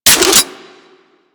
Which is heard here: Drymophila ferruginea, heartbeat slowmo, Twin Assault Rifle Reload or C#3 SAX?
Twin Assault Rifle Reload